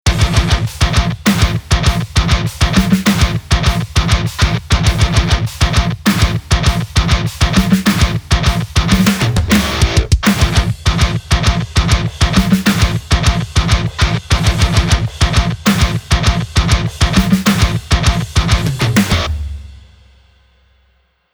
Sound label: New Metal Gear Test